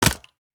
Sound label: mag in; spasi15; tacz; daffas arsenal